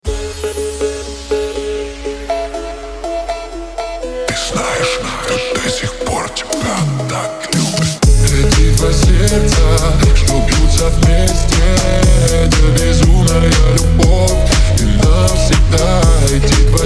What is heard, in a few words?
Phonk Remix